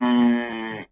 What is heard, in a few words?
Bull Idle